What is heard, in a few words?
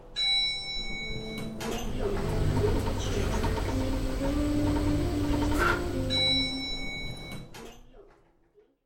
elevator